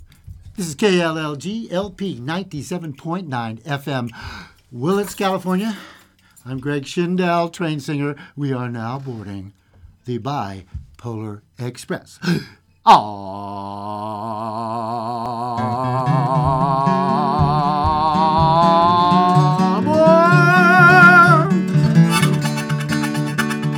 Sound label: Train Singing Conductor; BiPolar Express